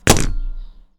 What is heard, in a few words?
Drop Item Heavy